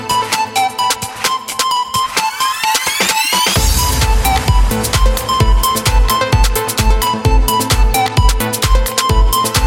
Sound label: La Musique